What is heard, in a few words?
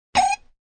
Funny Toy Sound; Funny Cartoon Sound 2; Funny little cartoon sound; Children; Human